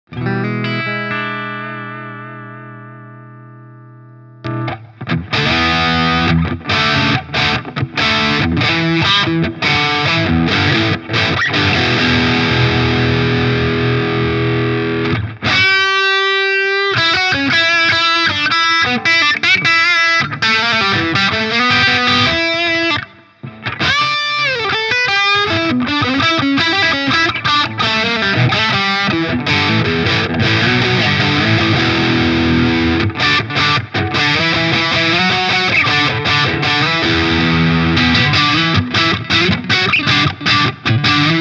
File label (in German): ordentliche Zerre; Friedman BE-OD